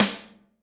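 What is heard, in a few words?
snare drum, 180 BPM; Ueberschall; Drum & Bass Resonance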